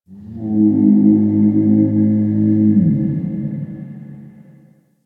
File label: Valley Night Distant Creature; Ambience; Warframe; Quests; The New War